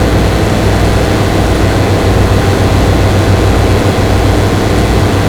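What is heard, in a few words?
power transformer hum close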